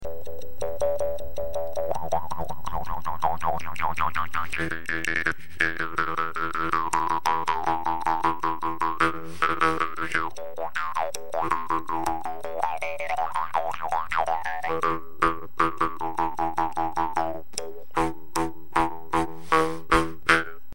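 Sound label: cambodian Bamboo, jews harp; cabodianBamboo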